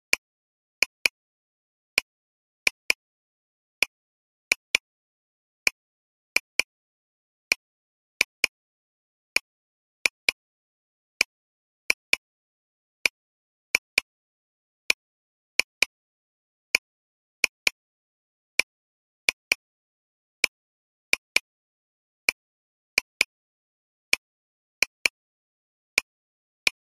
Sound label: Wavy As Ocean Waves - Metronome; Underhero